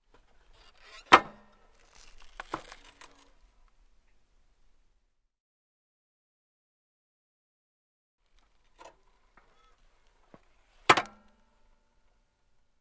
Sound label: Motorcycle is put on center stand